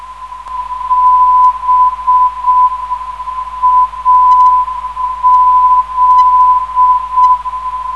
418kHz, ndb

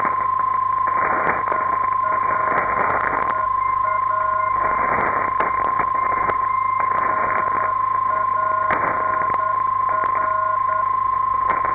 npr 488khz, ndb

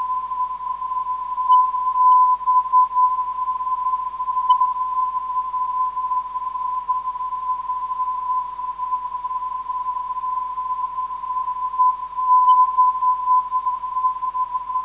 379kHz, ndb

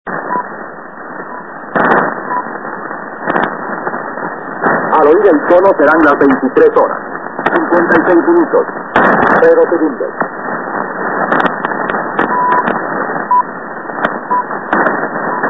hd2ioa 3810khz

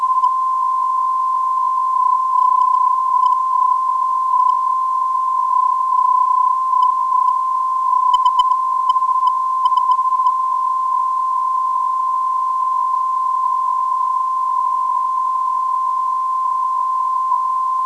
395kHz, ndb

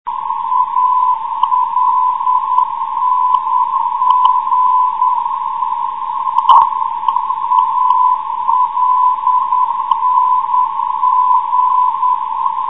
alng 322khz, ndb

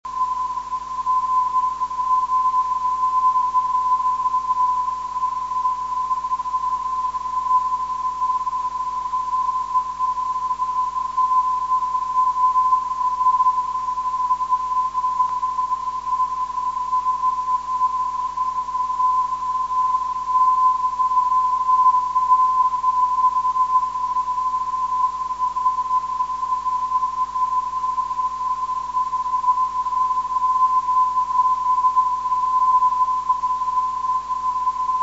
nat 337khz, ndb

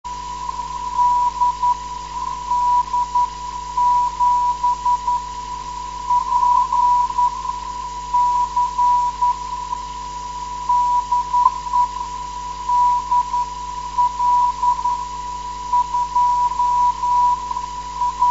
472.5khz; 472khz web swl; dl2hre

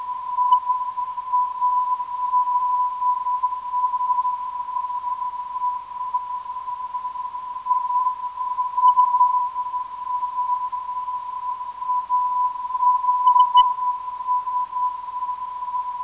396kHz, ndb

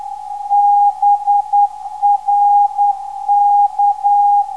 408kHz, ndb; BRK

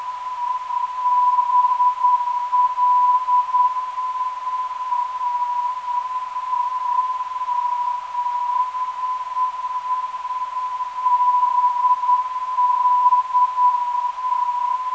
335kHz, ndb

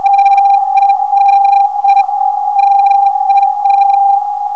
441kHz, ndb

